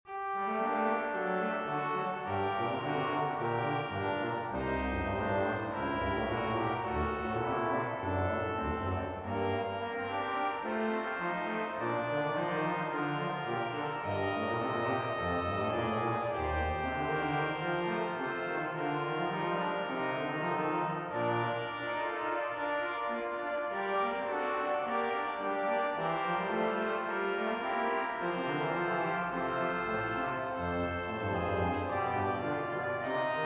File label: organ, passiontide